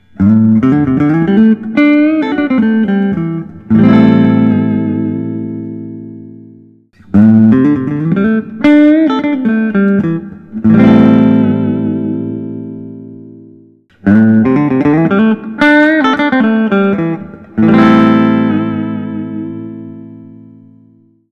Gretsch Nashville 6120 koa

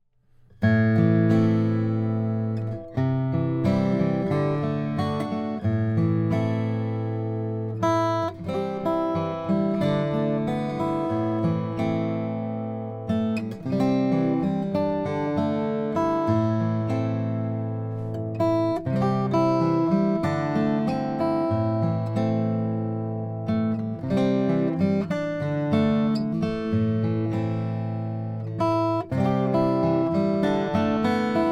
lowden o50c koa; with bevel mastergrade

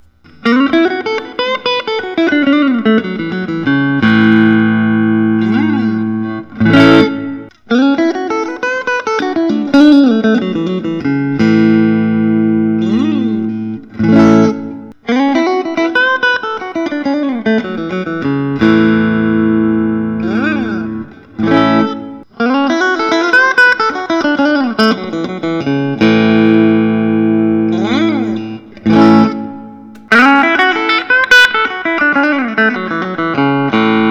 classic s flame top tobacco sunburst; suhr

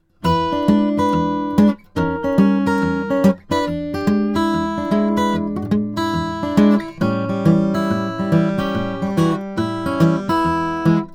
martin d 45 brazilian rosewood

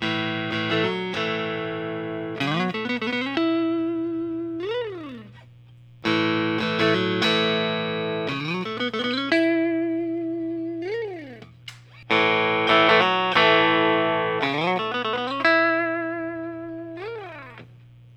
fender custom shop 54 telecaster

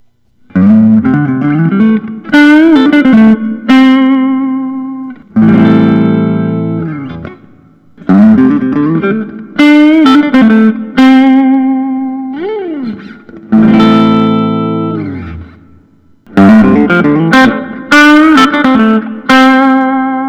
nik huber orca 59 brazilian rw cherry sunb

